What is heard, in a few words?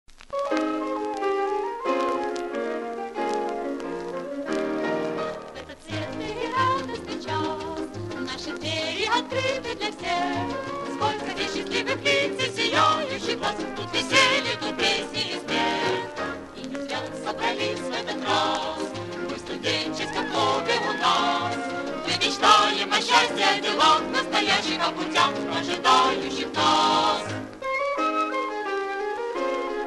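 vokalnyiy kvartet, vocal quartet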